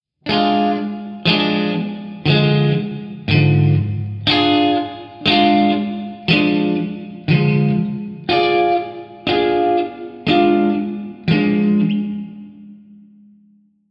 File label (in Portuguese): Montagem de Acordes Triade Diminuta